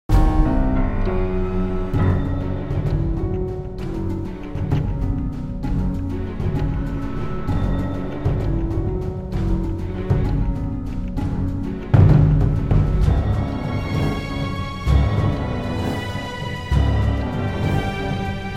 Airfield Stealth; music; The Da Vinci Code; XBOX

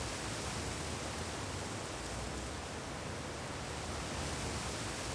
wind trees ambience; Star Wars Obi Wan; XBOX

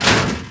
metal table hit; bouncyobjects; Tony Hawk's Project 8; XBOX

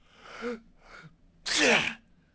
snooze, CHURCH; AMBIENT; Pirates Of The Caribbean

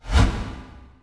whoosh

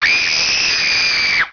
scream; Chase Hollywood Stunt Driver; XBOX

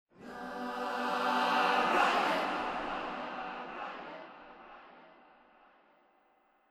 MUSIC; Myst III Exile

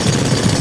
jackhammer loop

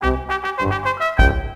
Win

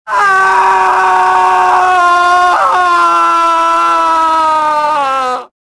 falling

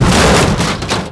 hit mtl; WhiteOut

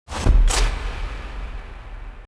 Cinematic Flash

